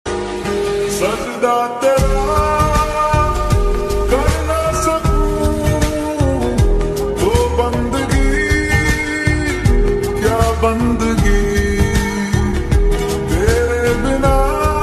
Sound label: lofi song